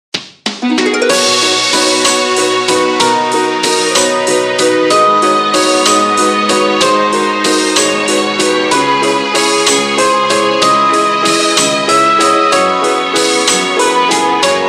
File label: Waltz With Birds, ringtones; SKY IM-A890S-VEGA-Secret-Note